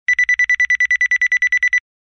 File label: Single Tone; Samsung SCH-U490-Trance